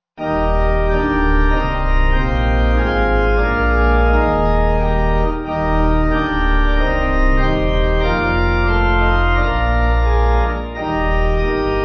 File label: Pipe